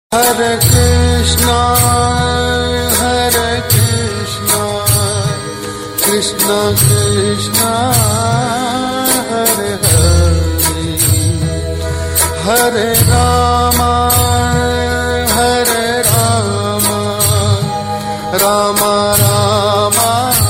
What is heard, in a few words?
sad Ringtone; hare krishna Hare Krishna